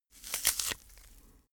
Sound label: bone fracture